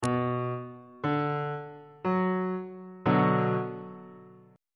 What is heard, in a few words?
c flat minor chord on bass clef